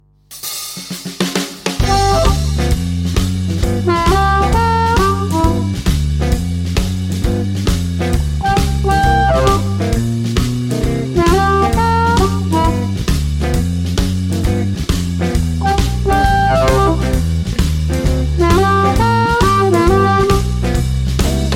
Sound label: jump blues backing track; g harp